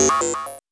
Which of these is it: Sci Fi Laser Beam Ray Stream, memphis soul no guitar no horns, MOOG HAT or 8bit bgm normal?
MOOG HAT